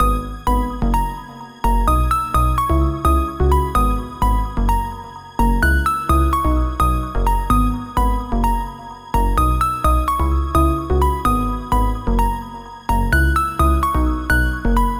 128 BPM G# Min, house breakdown loops; Fuse; Cymatics

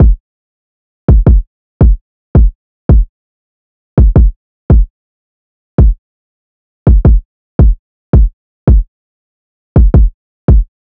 Drum Loop 166 BPM Kick, Trap; Loop Stems; Chamber

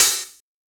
Open Hat; Ghosthack Advent Calendar 2017 Day 5

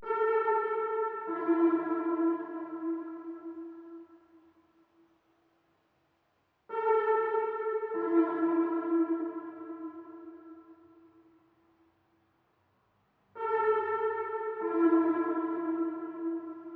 144 BPM A Min Synth; Trap; Melodies; Loop Stems; Cymatics; Trap Starter Pack; Betrayed

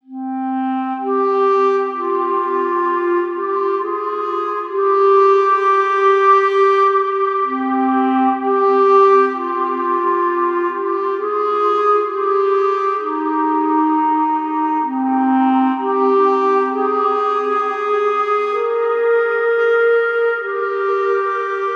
Woodwind - Clarinet - Cm 65Bpm; Ghosthack; SkyRealm

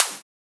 Snare - Snap; Psytrance

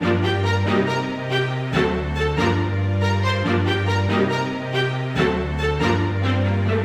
String Loop 140 BPM G Min; String Loops; Future Bass; Instruments; Cymatics; Future Bass Starter Pack

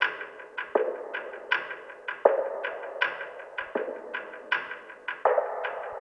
Percussion

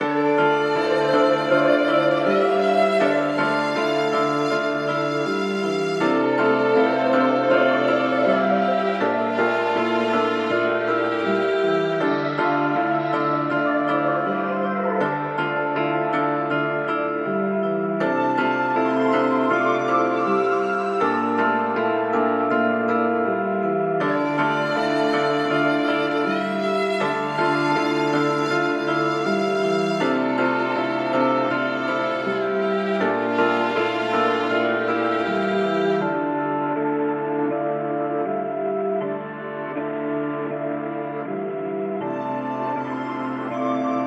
160 BPM D Min, Melody Loops - Trap; Losses

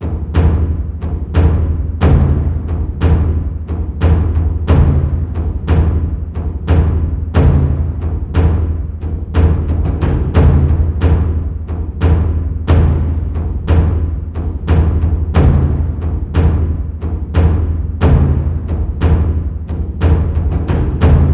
Framedrum, 90BPM, Percussion; Percussion Kits; STEMS; Ghosthack; SkyRealm; Kit 14 - Wrecking Force

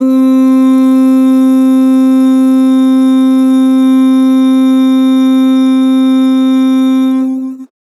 Ooh, Vocals, Flat; Harmor Vocals

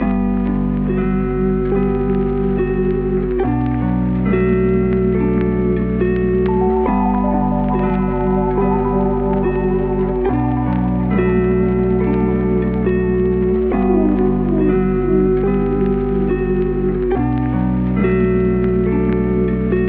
70 BPM B Min; Melody Loops; Bluebird; Cymatics; ANALOG EVOLUTION Beta; Lofi Collection

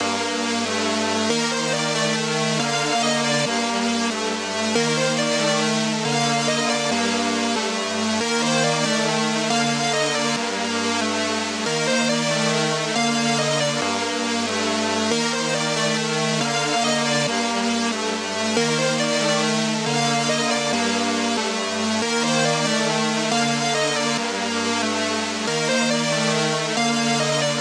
139 BPM G Min Synth, Loop Stems; Melody; Cymatics; 2022 Melody USB Expansion; Hemp

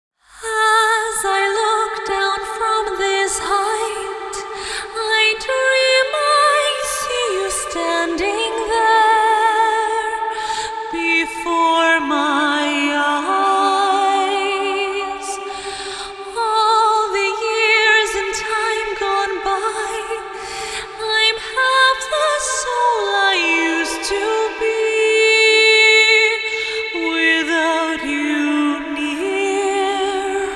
Top Line Vocal - Fm 60Bpm (WET); Construction Kits; VOCALS; Ghosthack; SkyRealm; Kit 04 - High Rock View

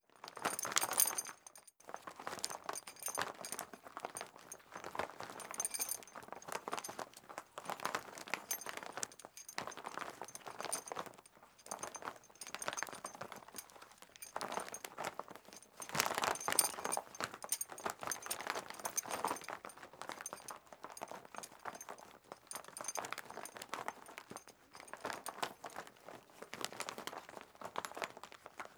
Flag Flaps with Metal Clanks; Fairlight Sound Lib

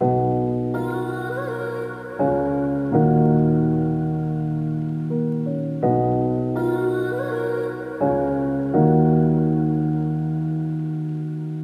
Classic Melody Loop 165 BPM D# Min; Cymatics; 2020 Melody Collection

